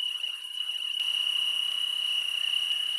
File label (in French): GRILLONS